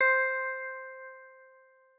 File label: C2 elec guitar; turtlestitch